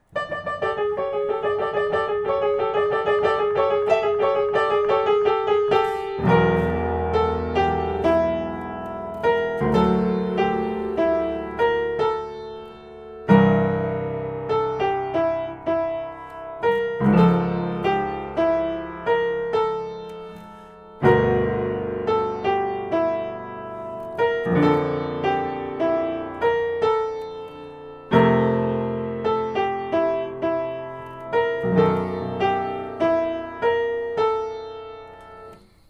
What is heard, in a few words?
melody